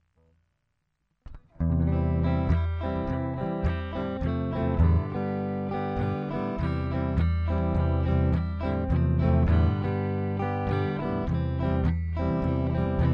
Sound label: gitara